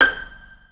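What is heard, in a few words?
solo pizzicato, Strings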